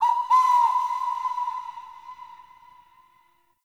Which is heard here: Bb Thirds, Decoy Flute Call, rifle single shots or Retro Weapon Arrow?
Decoy Flute Call